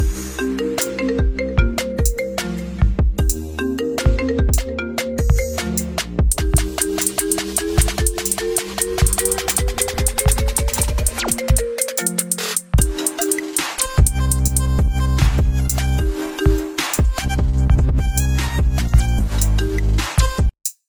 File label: iPhone iPad Pro 12.9 Official Ringtone